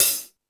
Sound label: Stadium Rox, Drum Machines, E-MU Pro-Cussion